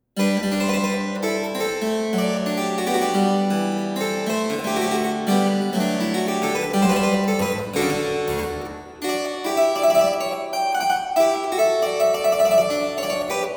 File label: Fanfarinette; Rameau